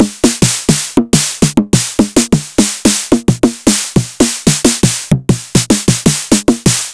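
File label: Roland Tr-909 snare drum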